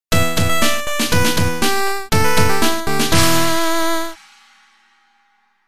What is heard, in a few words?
Jingle